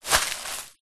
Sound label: foley footstep single on massive amount of loose vhs tape; zapsplat